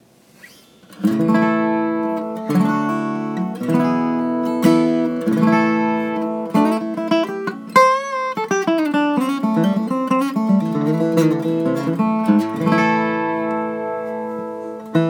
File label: klepper a capo; The first hour after tuning it up